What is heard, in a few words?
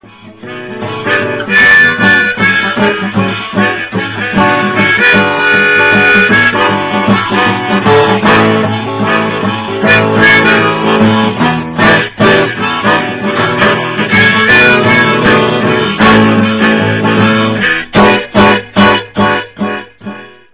blues